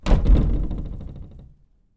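furniture cupboard large old fashioned door close rattle; household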